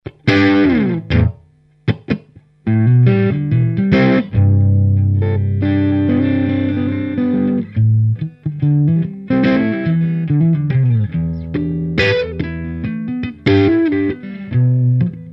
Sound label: Pico Plexi Head Mixdown; miniature tube amp; 12AX7 in the preamp